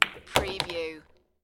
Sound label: Billiard, Pool, Snooker - Ball hits other ball which falls in pocket